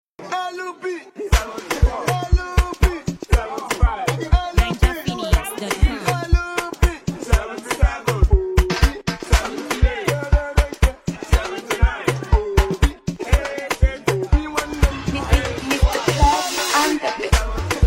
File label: Instrumental Hook; Peter Obi; TikTok